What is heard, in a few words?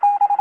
exclamation; The Golden Era